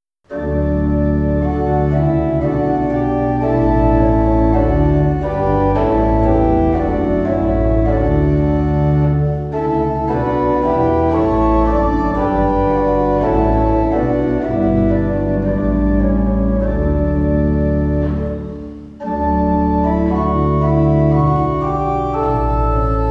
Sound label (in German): Orgel; Beim letzten Abendmahle